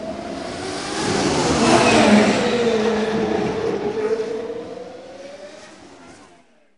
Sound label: race car; 1000fx